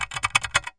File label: type, keyboard; huashu design; sfx